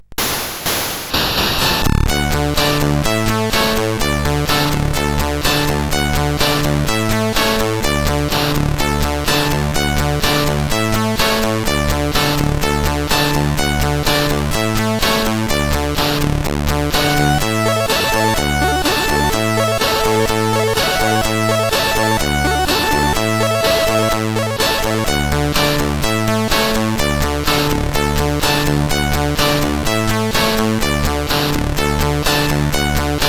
Campfire, CSG8580R5

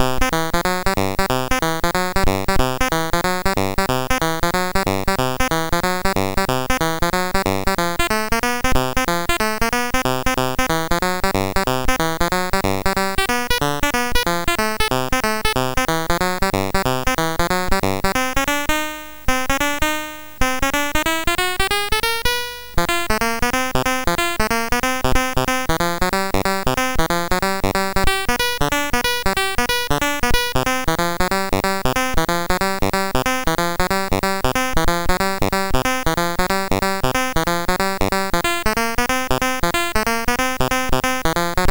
Chromatic Boogie, MOS 6581R4

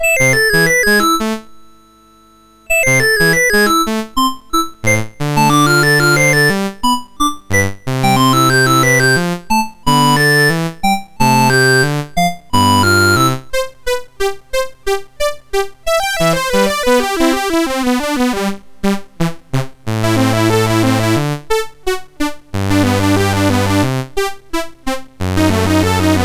Commodore Accompaniament, MOS6581R2

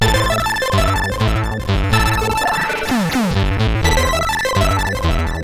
X-Music Remix, CSG8580

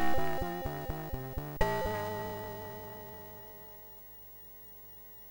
sid, MOS6581R3; Atic Atac C64

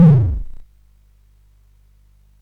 SFX; CSG8580R5; Narco Police

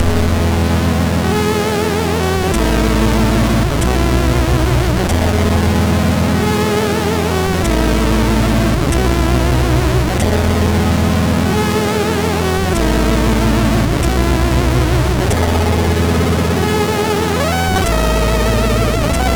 Short, MOS6581R3; hvsc; Luca

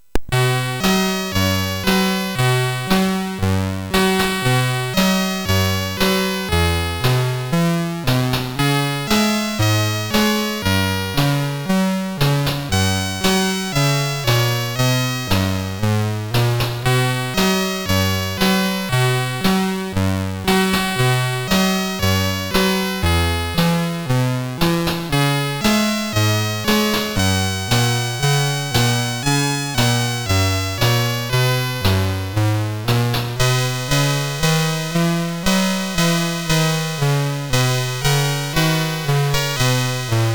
Jingle Bells, sid MOS6581R4

